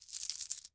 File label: Coins Poured Out; Miscellaneous; 1001 Sound Effects